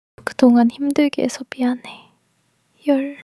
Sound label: crying